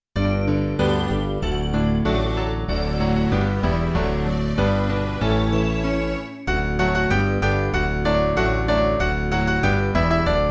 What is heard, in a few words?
midi